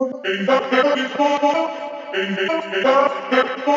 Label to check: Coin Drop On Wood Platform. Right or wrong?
Wrong